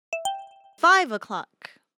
Hourly Alert

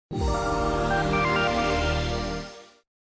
Power On, phonetones Other tones; LG; E500

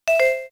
voice rec end; LG SU780 Lollipop 3

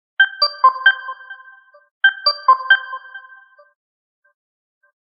Alarm; Hisense U820

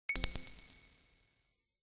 Alert Shine; HTC AT&T Tilt 2

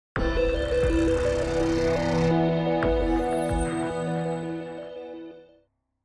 boot audio; Explay; Bit

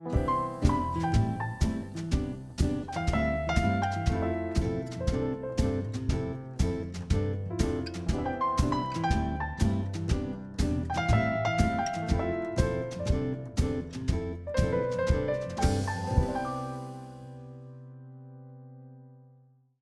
ringtones; Samsung Galaxy J3 Prime; Field Trip

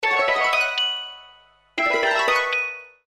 Ring Tone; Sony Ericsson P900

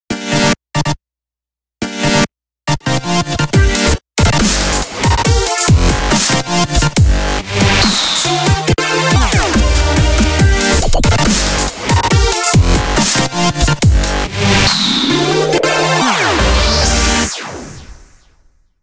Wiz Bang, Nokia Tones

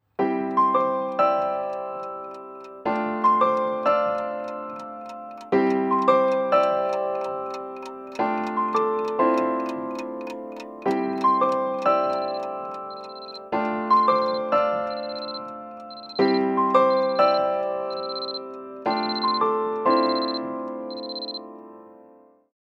Dawn, alarms; Huawei P30

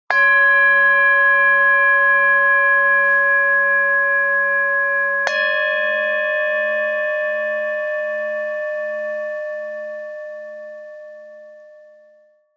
Two Bell, Ringtones

